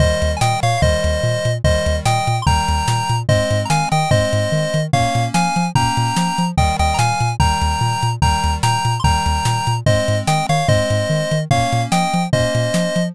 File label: bgm street